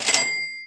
SFX Cash Pick Up; Game

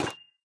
Lamp

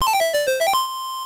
Coin; Xor World; arcade

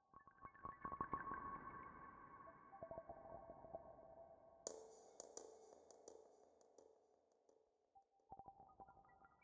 Basic Low Beat 85bpm

